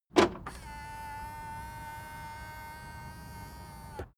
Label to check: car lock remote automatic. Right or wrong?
Right